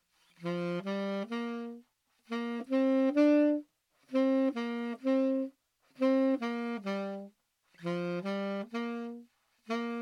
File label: loch lomond slow tenor, saxophone